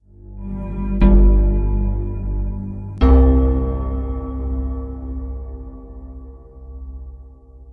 Stingers intro sting

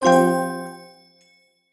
card match; escape from frankensteins tower

